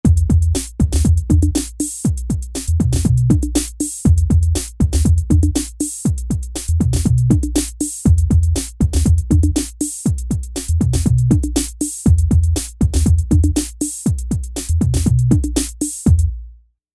Rap Hip Hop

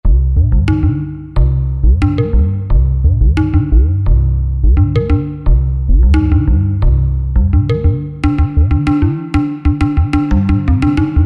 Tabla Talk, Korg 05RW

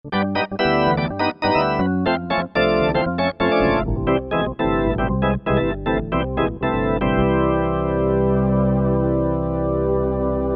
Rotary BX3, Organ

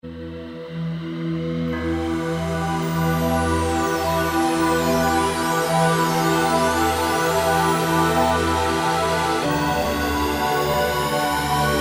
VOICE+ORCH, Kawai K1m; Multi

